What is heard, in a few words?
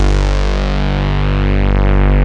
MOOG BASS; Kid Nepro; Elite